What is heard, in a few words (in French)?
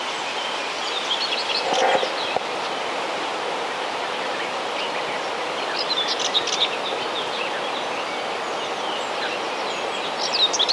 fauvette grisette